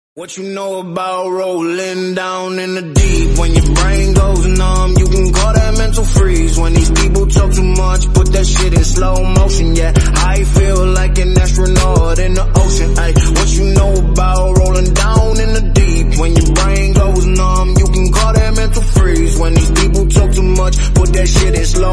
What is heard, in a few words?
Remix Ringtone